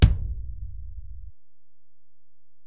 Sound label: Ac BD, Alesis DM-PRO; 300 Drum Machines